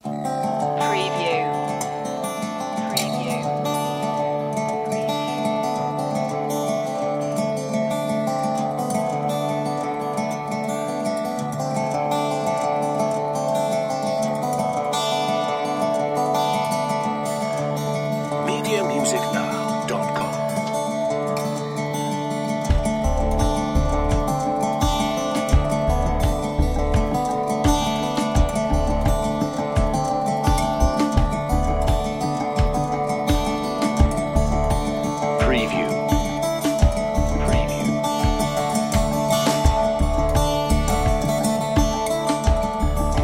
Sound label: mississippi walkin no lead